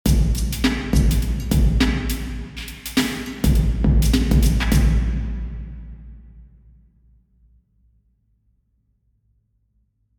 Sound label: drum, cathedral; Lady Chapel St Albans; omni